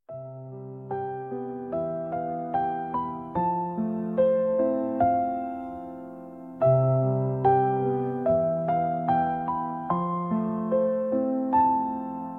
Study Beats

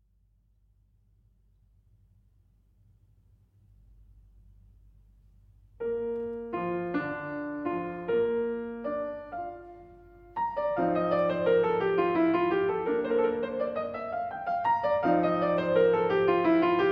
Piano Sonata No. 17 in B Flat Major, Allegro

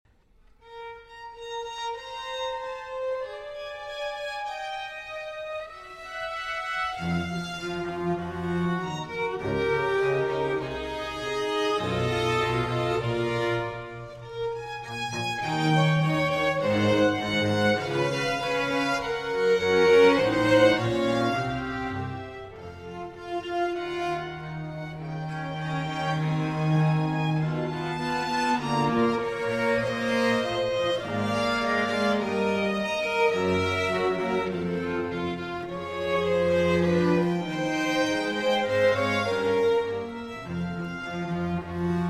Sinfonia For Strings and Continuo in B Minor

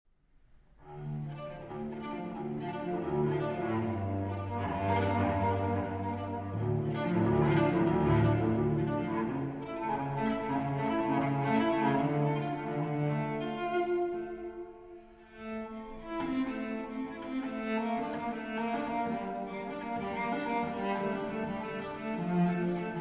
Arpeggio in D Minor; Abel; AbelWV A1A26

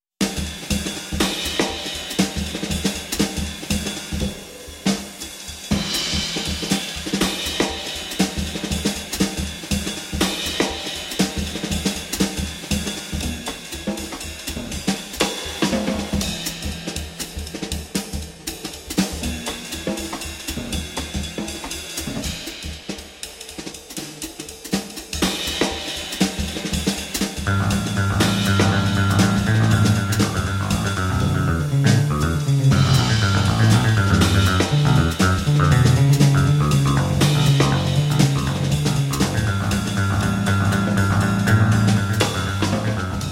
In D Major, Jazz Ensemble

